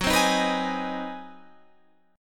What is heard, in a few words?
G sharp 7th Sharp 9th